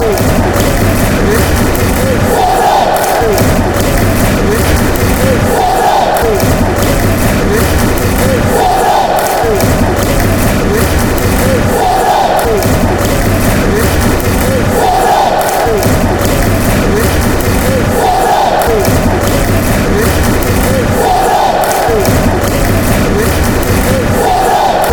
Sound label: clap chant; bcfc